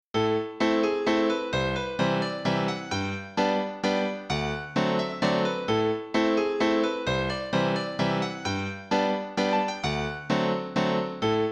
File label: A minor Waltz